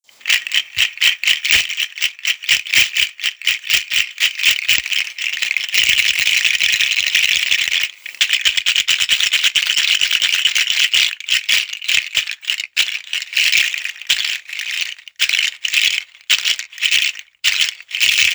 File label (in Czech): juju drzadlo, chrastidlo